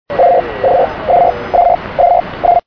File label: onda corta